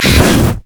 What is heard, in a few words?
dragon basic attack, monsters; LoL